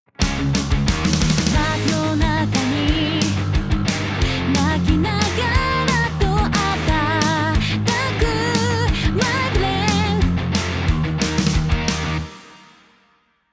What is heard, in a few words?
Double Off